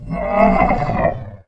scrm; The Elder Scrolls III - Morrowind

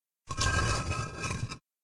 Deep Rumble Walls; SyrinScape; Hunting horror battle

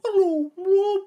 Hello bird; SyrinScape; Syrinscape unplugged; Jungleplanet dinolaser battle

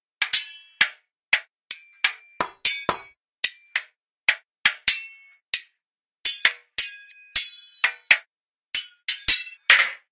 Weapon and shield; SyrinScape; Iris hill manor

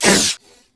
roar; The Elder Scrolls III - Morrowind

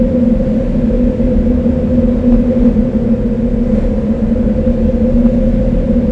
cave wind; The Elder Scrolls III - Morrowind; Morrowind GOTY GOG

